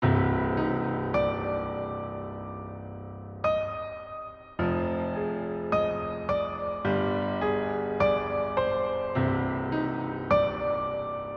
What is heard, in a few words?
Piano Version